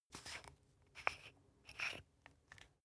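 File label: toothpaste open, Bathroom; haus-garten; badezimmer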